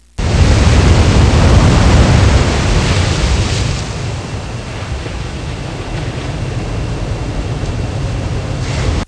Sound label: tide